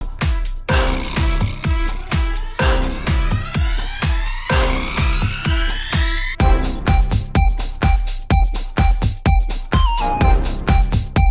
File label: TECH HOUSE INTRO BPM 126